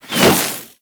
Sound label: Arachnoid Camper Terra Ice Proj Whizzby; Warframe; Corpus; Abilities